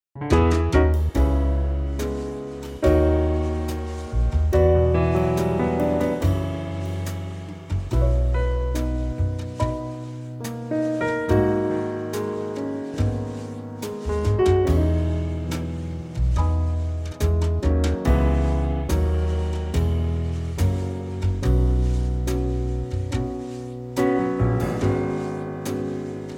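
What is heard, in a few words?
trio, Backing Tracks for Male Vocals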